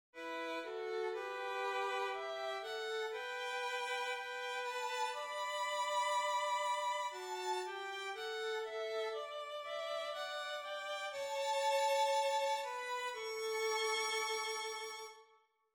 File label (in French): VIOLON